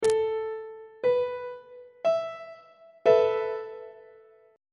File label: e suspended 4th 1st inversion on treble clef